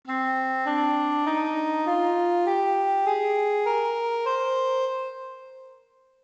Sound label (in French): nahawand, La gamme nahawand; Elle commence par la tonique do